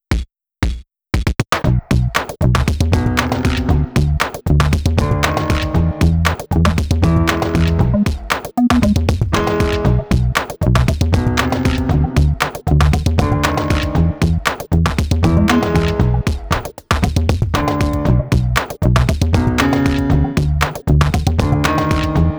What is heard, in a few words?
Dischordant Beat; Foreboding